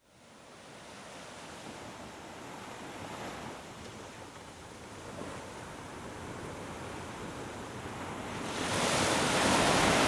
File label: Music Ocean; relaxing music of Solace Found with the Ocean